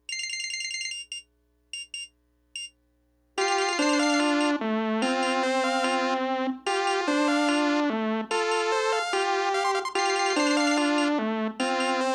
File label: Polyphonic